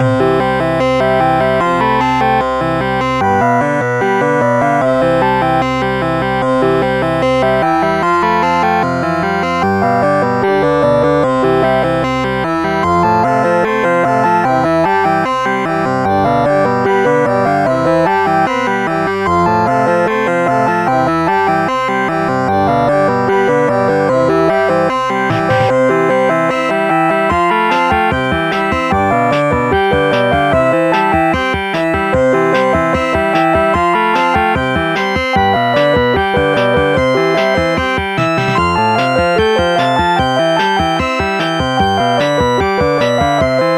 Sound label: musicbox; Instant Music